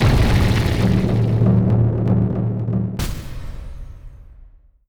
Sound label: blood rite; weapons; dota; bloodseeker